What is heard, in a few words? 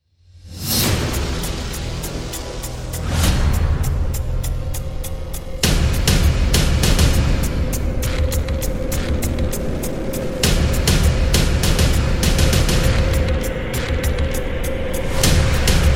trailer backends time bomb